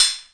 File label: Korg KPR-77